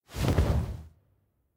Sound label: Change Outfit